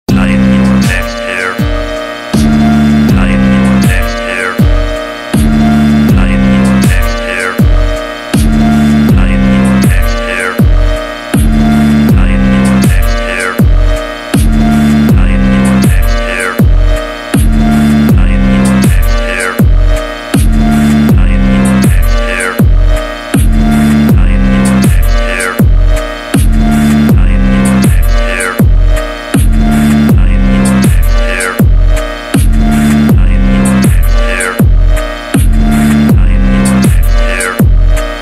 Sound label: ROBOT